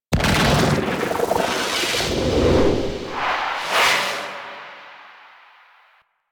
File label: Queens Lair Operator Scepter Grab; Warframe; The War Within